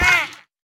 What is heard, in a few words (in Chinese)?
海豚受伤声